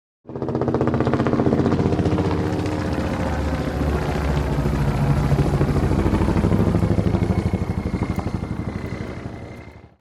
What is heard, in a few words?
Police helicopter overhead flying overhead and away